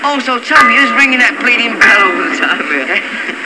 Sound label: bell